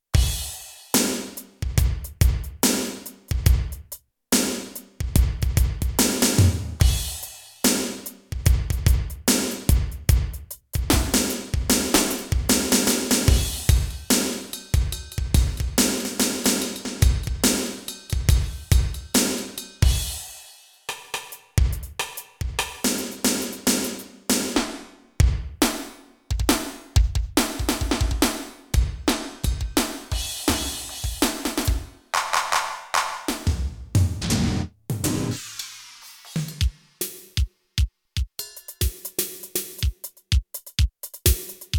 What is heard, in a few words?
Drum Kit, synth